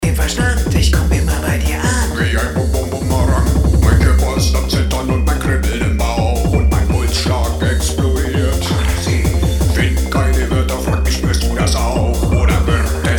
kick

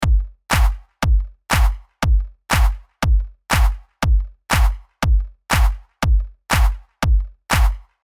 pre clap

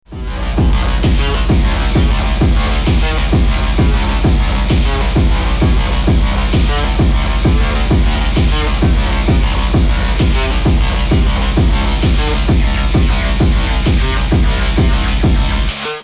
pendulum snippet stereo, industrial techno

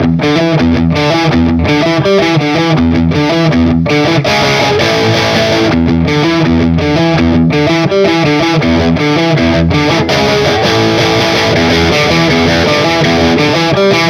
Amplitube Orange; orange ampsim; guten rock sound

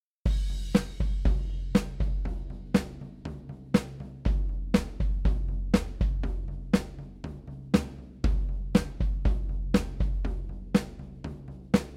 ludwig concert roh

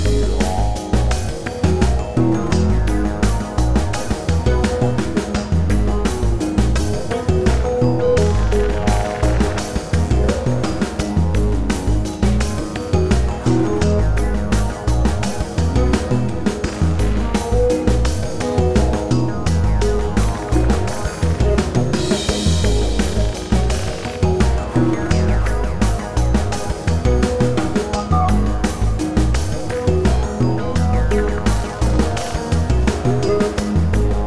ambient

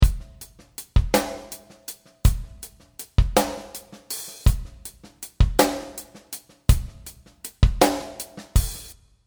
valhalla drum

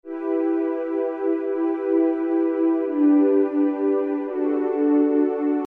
pad

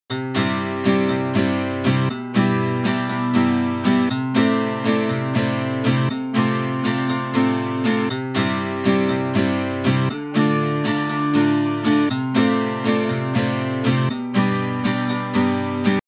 guitar

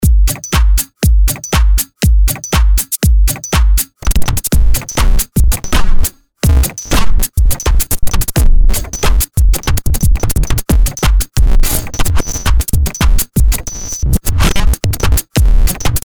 glitch maker, Glitchy IDM; rollende zerhackstückelte Break Sound

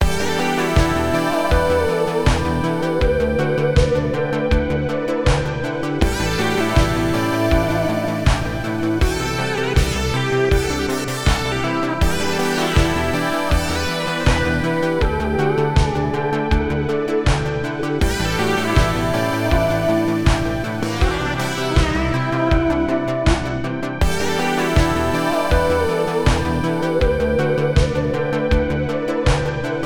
retrowave lead

